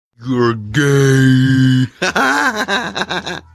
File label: gayyyy hahaha